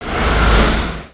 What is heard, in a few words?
Spider Boss Jump Down; creatures mechanical